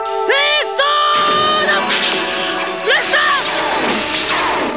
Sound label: space sword; Sailor Uranus; Space Sword Blaster